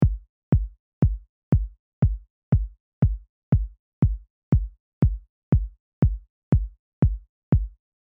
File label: Chordal Kick; reverb